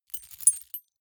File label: key ring large rattle air soft